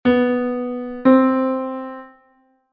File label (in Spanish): 2ªm Si2 Do3, 2ª menor ascendente; intervalos musicales; ¿Qué intervalo suena?